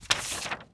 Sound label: quick stop; netent